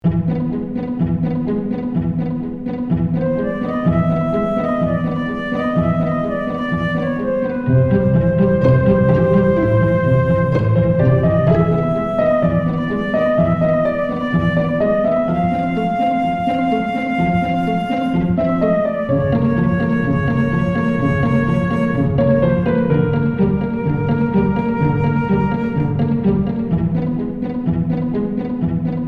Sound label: QUIRKY ORCHESTRAL